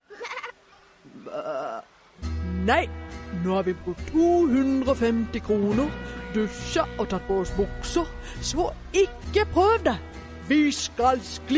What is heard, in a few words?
western musikk